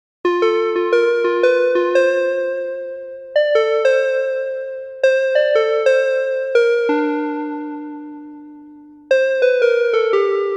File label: Vibes